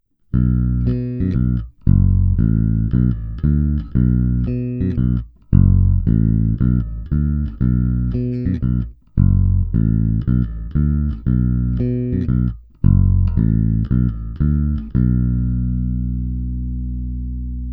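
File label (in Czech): Cívka 1 a 2 sériově – kobylkový a prostření snímač; celkem hodně blízko obvyklému zvuku při sériovém zapojení cívek na basách Music Man StingRay5 nebo Music Man Sterling; Ernie Ball Music Man BIG-AL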